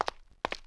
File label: Footsteps